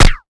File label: projectile impact; sniper